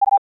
bip on, radinho

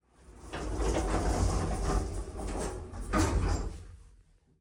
enter exit

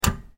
switch option

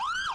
police, sirene

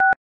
telephone disk

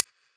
button highlight

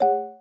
checkpoint

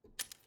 success; lockpick

